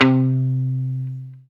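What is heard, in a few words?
guitar mute; 90's Sample CDs; Club-50 - Foundations Roland; Funk & Mute; Chunk Mute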